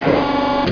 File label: door elec